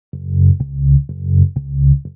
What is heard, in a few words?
bassline 4 beats